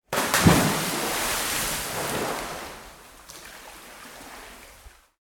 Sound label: Splash Interior Large, Dive or jump into pool; Water; Nature